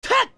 Attack; Adult Link; Link's attack shouts (sword slashes, rolls, or targeted jumps)

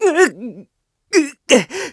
Tornado Struggle; struggling to stay on his Loftwing; Link; Skyward Sword; zelda

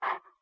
Wolf Huff Sit; Wolf Link breathing while sitting ("A" in lower pitches)

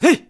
Run Slash; Link's running sword-slash (also used for a sideways jump slash)

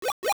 Baby Crawl; OOA; Link; Zelda; Oracle

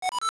Text Done; Dialogue text - finish a page

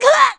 Splat; Link landing from a high jump; Zelda

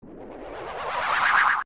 Poe Appear; zelda; OOT

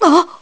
Surprised; Link surprised (when a door closes behind him); zelda

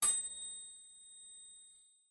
Golden Bug Ting; A Golden Bug's "ting" This sound repeats at random intervals, with random volume changes (mostly lower), and pitch chang

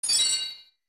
Ship Chimes; Shadow Temple; zelda; OOT

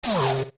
Ball Reflect; Phantom Ganon; OOT

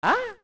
Ah; Zubora (of Mountain Smithy): "Ah!"

